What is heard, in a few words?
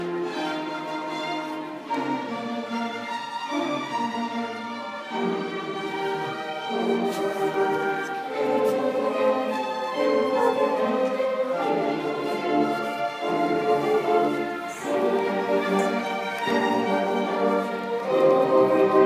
orchestra